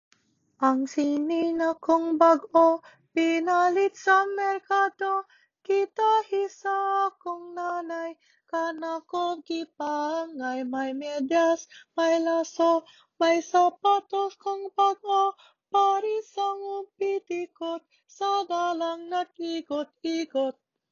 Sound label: Melody; Ang Sinina Melody